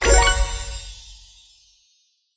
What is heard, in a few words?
slot